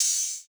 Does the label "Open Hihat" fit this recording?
Yes